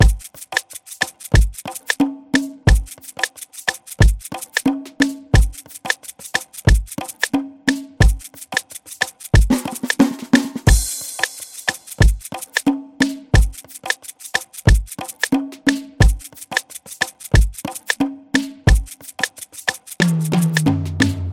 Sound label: 90 bpm afrobeat drumloop; ojoro